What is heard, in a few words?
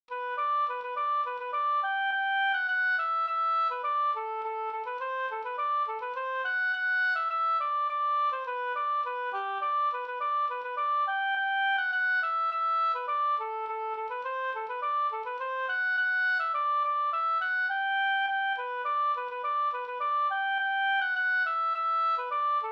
polka